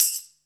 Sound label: tambourine; Zero-G; Total Drum Bass; Drums Percussion